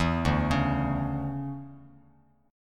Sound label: D Major 7th Suspended 2nd, Bass Standard; arpeggiated; JGuitar